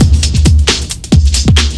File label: LOOP; SoundFactory; DRUMLOOP